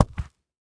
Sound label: Foley Footstep High Heels Rug Carpet Single Step; Foley Footsteps